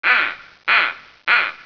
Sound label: duck, whistle